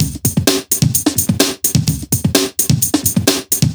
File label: House Beat, 128 BPM